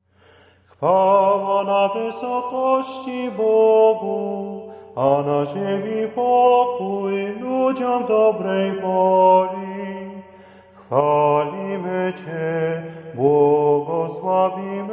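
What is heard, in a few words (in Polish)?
Chwała na wysokości Bogu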